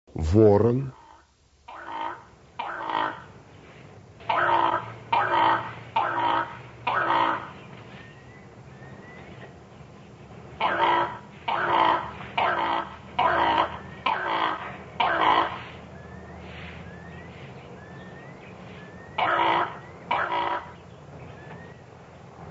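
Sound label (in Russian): voron, голоса птиц; Ворон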